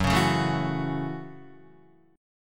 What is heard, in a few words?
Gb Minor 7th, Guitar; strummed; JGuitar